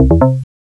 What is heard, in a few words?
epicwin; GoldSrc